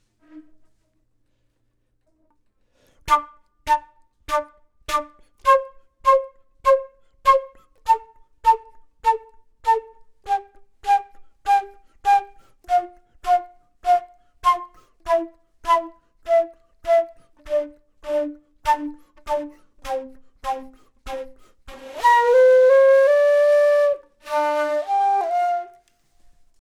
flute; logic

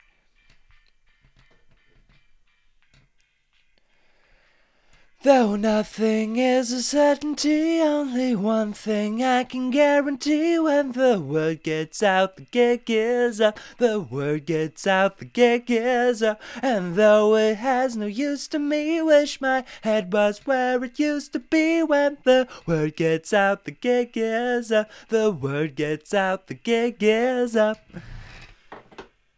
vox; logic

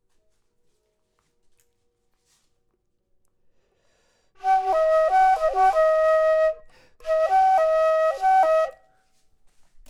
flute low; logic

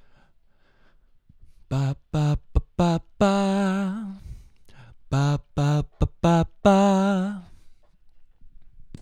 vox; logic

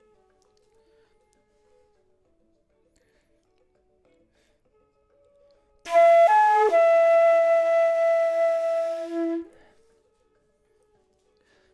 flute; logic

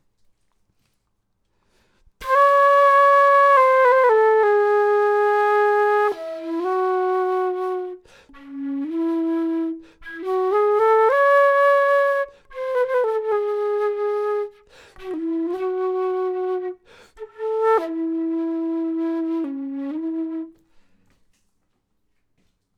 flute intro; logic

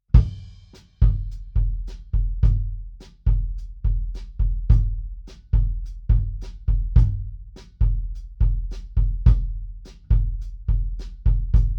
kick; logic

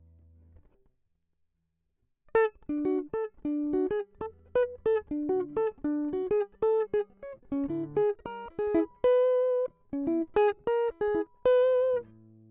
Small Bright Combo

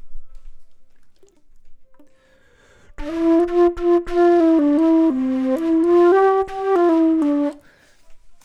flute

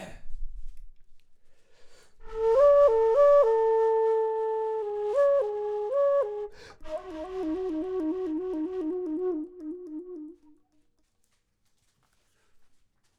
flute low; logic

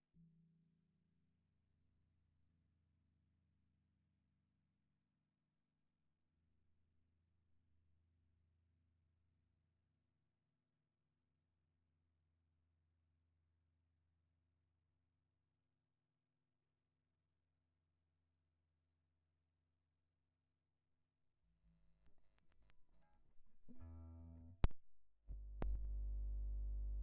Small Bright Combo; logic

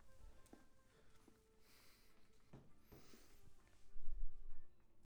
flute; logic

